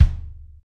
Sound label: Folk Kick; Roland BK-5